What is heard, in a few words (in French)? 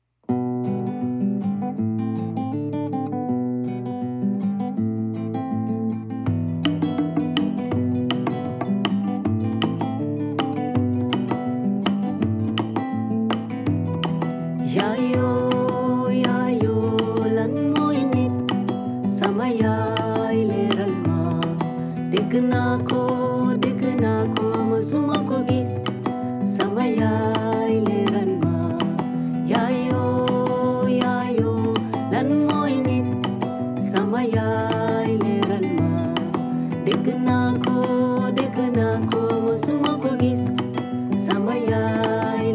musique le lionceau wolof